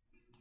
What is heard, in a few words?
silence